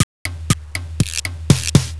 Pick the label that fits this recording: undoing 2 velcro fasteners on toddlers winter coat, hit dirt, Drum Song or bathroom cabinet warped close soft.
Drum Song